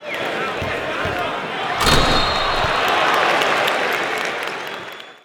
taunt; dota; oracle

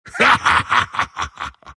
troll laugh; dota; troll warlord

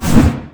pre attack; weapons; dota; huskar

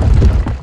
magnetize debris; weapons; dota; earth spirit

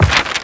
page turn, book; ui

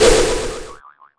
sproink; weapons; dota; enchantress

